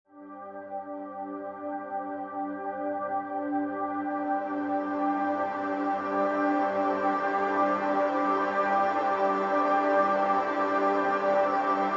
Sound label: ambient